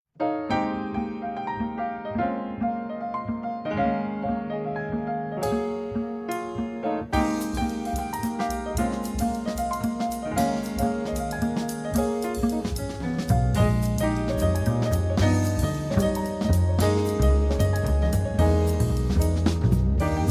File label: Trio Waltz